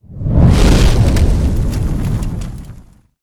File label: swing fire